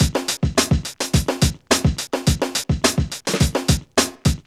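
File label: 106 bpm, Funky loops; Zero-G; Funky Elements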